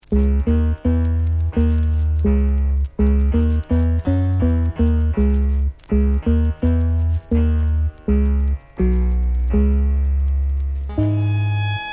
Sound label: A Java Instrumental; Pressure Sounds